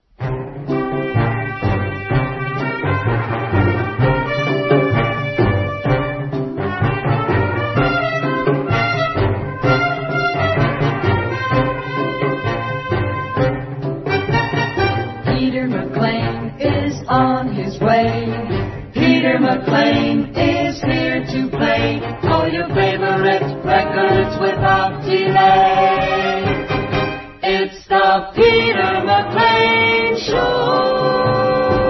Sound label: jingle; pams series3